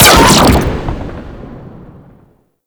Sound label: Combat Pod Rocket Launch; Warframe; Vehicles